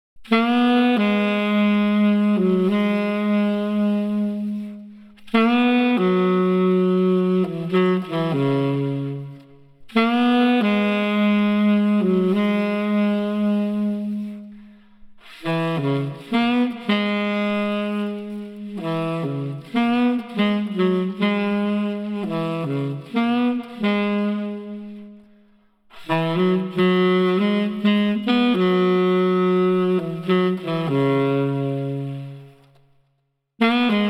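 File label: Street Tenor Sax Melancholy, 70 bpm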